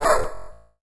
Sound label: Up Dog Bark